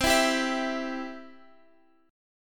C Major, Guitar Open F; strummed; JGuitar

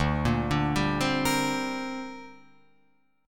D 7th Suspended 4th Sharp 5th down Guitar Open F; D7sus4#5; arpeggiated

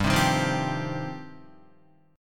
G 7th Suspended 4th Sharp 5th, Guitar

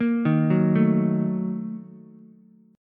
Bb Major 7th Suspended 4th Sharp 5th, Ukulele; arpeggiated; JGuitar

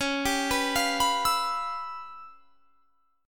Db 7th Sharp 9th Flat 5th Db down, chord shape; Db7#9b5 arpeggiated

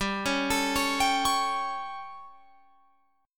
G Suspended 2nd Flat 5th G Guitar Open F; Gsus2b5; arpeggiated

